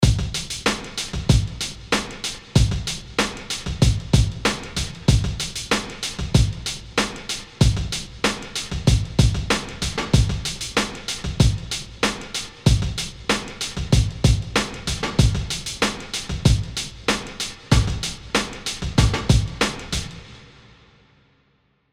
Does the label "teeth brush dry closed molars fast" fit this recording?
No